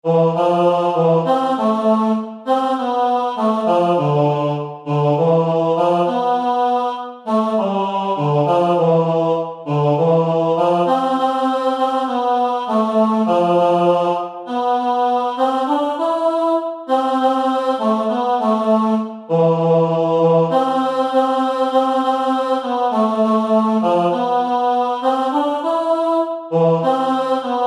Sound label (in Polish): Rykowisko spiew